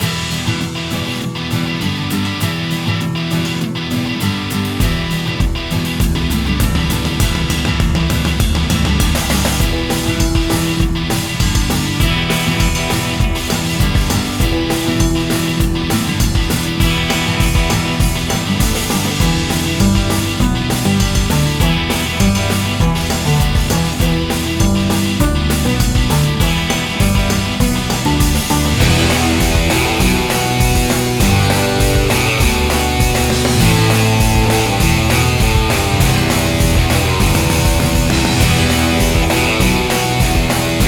music; Western

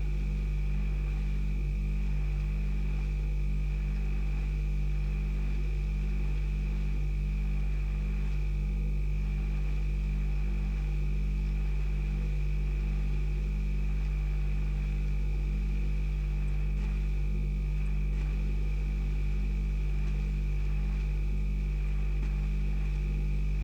light fridge

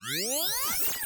Battle Powerup; Hilzartov Fairy: Size Doesn't Matter

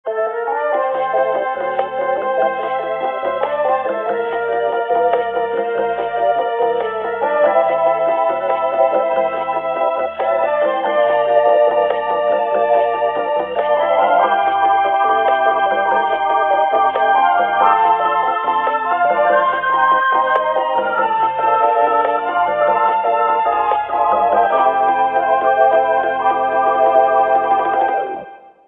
Diegetic Ambient Bossa; Mirror's Edge Catalyst

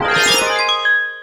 SUCCESS CHIME Bells Sparkle Tune; Zen Pinball Party; iOS, macOS; Trolls

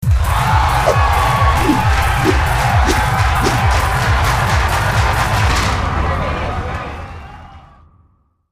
Intro Disco Female; KickBeat

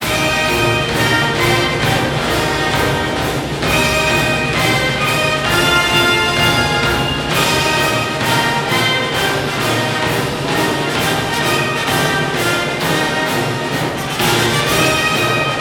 Broadcast Outro

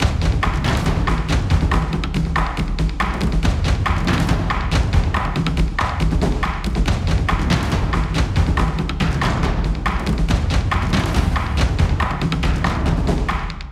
Combat Percussion LOOP ACTION; Aira VR

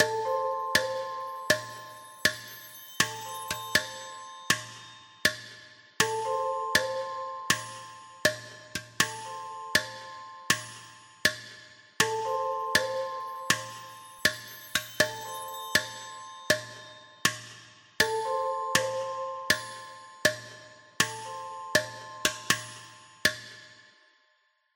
Workshop Marimba; tintin reporter cigars of the pharaoh

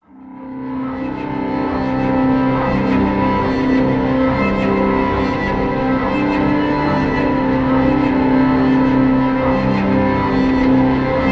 Overlay Drone; Act3 Rezoning; Mirror's Edge Catalyst

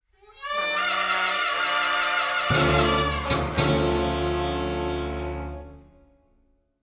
Death Stinger; Sly Cooper Thieves in Time; PS Vita; PS3

